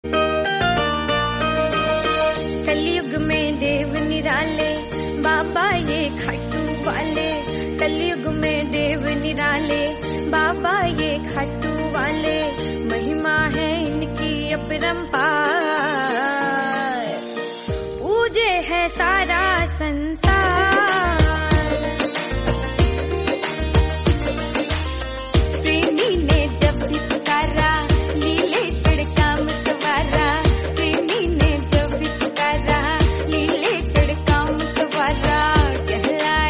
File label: Khatu Shyam Bhajan Hindi Ringtone